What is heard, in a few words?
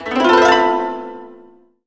koto gliss; AKAI S-Series CD-ROM Sound Library VOL-7